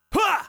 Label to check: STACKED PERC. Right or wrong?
Wrong